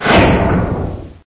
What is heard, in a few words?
doorstop, doors; source engine; hl1; video games